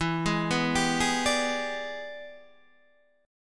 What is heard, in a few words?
E 7th Sharp 9th Guitar Standard, chord; E7#9 arpeggiated; JGuitar